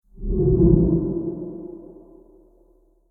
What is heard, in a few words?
Spooky Horror Transition With Reverb; Scary Soundscapes